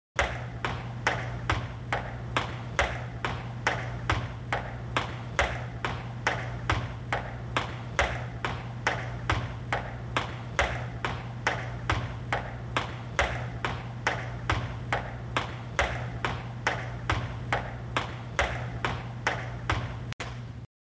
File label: footsteps woman high heels